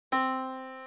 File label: Yamaha Disk, piano sound